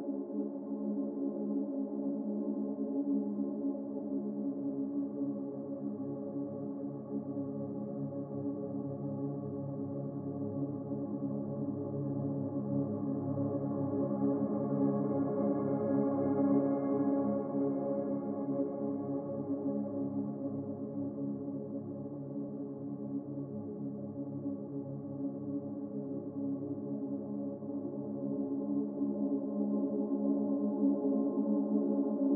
Sleeping Alien Loop; Immortal Planet; PS4, Switch, Windows, Xbox One

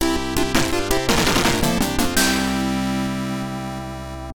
Jingle (OPN Version); Hiouden; PC-9801

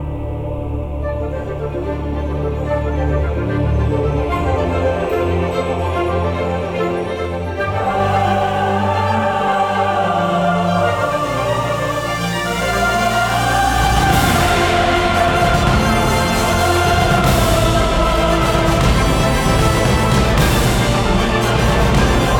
loop multiball; Panda

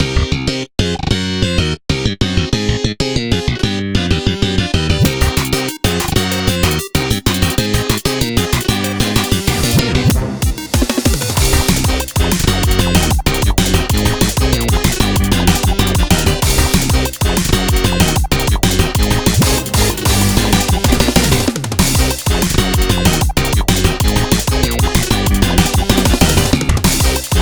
(Instrumental); friday night funkin vs. impostor alternated; Sussus Bloogus

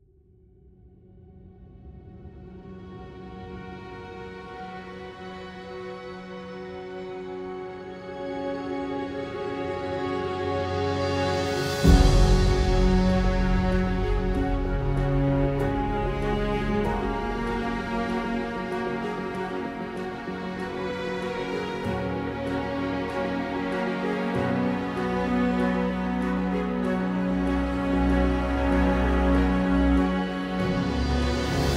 Trailer Music; Microsoft Flight Simulator; Xbox Series X|S; Snow 2.0

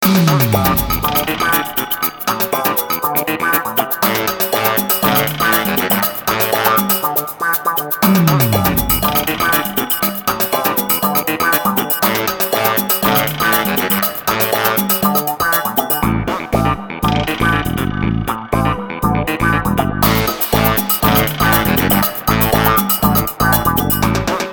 Title Instrumental

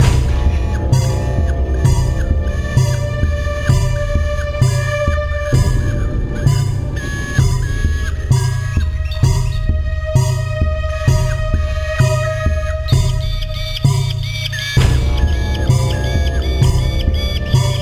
bgm doom

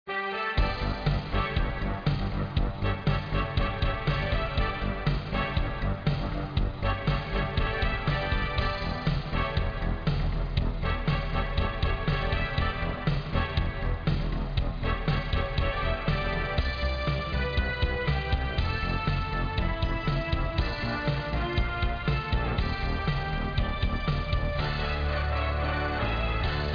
Debrief Success; Strike Fighters Attack; Android

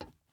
glass step; teardown; ps5 windows xbox series xs

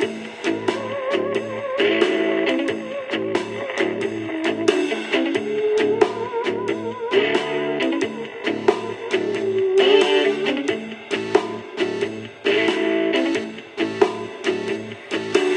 Jukebox Loop

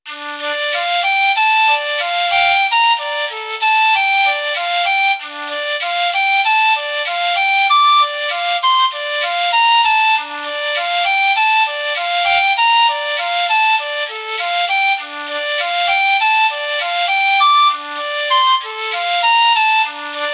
Flute; Domestic - Flute Rome

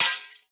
glass hit

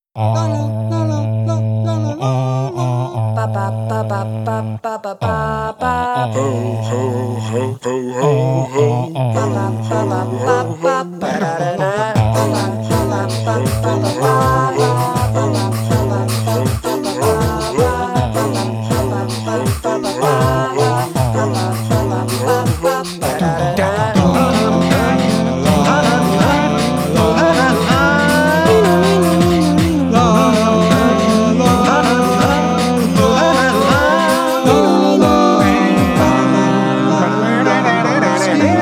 City Slum Acapella Version; Theme Of Double Dragon

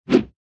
Sfx Lancer Attack

